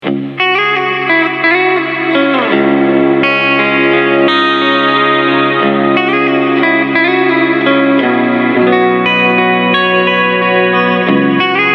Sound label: long mod tails